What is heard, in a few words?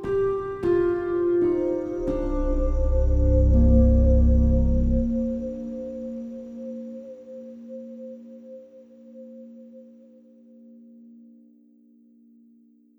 Piano Pads; BB 2HTC Samples Vol 4